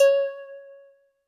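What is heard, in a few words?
Jazz Harp C#4; Kawaii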